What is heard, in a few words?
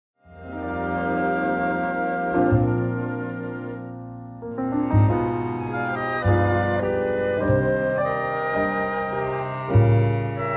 Karaoke Version